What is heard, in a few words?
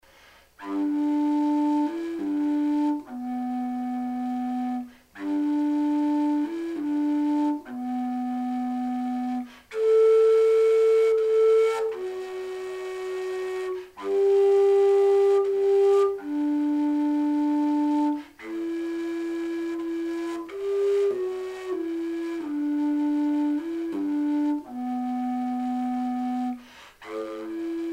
fuyara g silent night; folkart slovakia